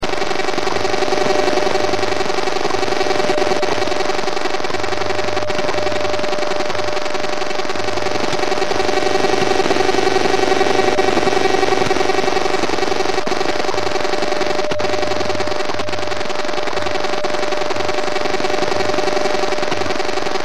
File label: 10mhz power on